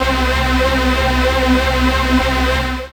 dance chord; instrumente

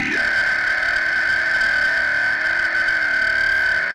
Monster

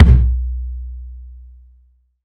bd room; percussion

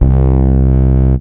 Reso Bass

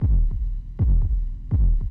Deep heart Tone

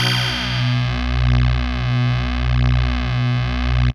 Analog Ping Pong; instrumente

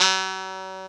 Saxo

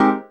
dance chord

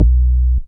Moog Bass

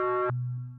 Telephone

